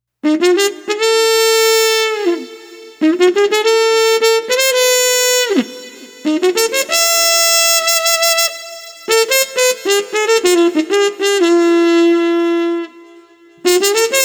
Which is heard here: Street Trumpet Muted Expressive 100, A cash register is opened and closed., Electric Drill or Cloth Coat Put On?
Street Trumpet Muted Expressive 100